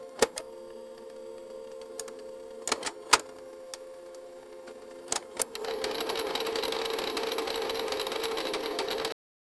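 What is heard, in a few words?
slot machine spins and wins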